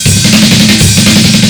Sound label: 160 Garage Drum Fill; Dance DJ; Drums; Garage Breaks